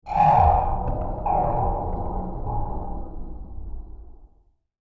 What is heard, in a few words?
Sub Depth Charge, bomb sound effect; explosion sound effect; Andrew Fleming